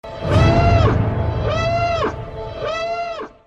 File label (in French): sonnerie alerte rouge